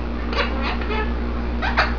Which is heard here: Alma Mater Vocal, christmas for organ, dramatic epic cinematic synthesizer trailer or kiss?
kiss